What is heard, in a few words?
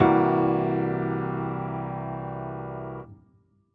Cmaj7 Raised 4th